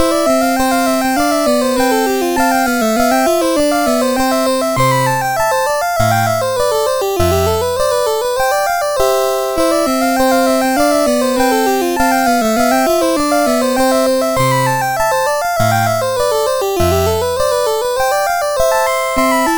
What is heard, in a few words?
Keyboard Piece No5 C Major, CSG8580R5